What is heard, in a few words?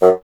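wind bassoon F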